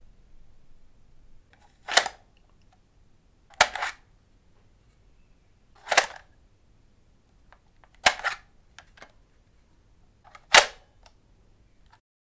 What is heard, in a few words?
Gameboy Cartridge Being Inserted